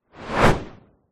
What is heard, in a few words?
whoosh fast; sound design